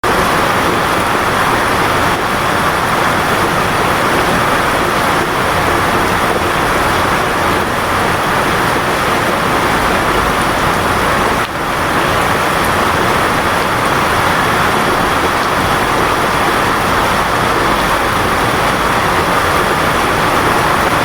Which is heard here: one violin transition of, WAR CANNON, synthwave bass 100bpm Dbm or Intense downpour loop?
Intense downpour loop